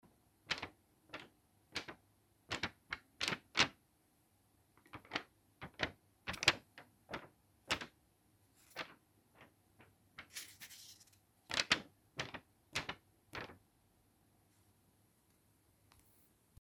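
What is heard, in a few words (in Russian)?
трясём доллар; Звук купюр, долларов; Звук купюр, долларов, отдельно и в пачках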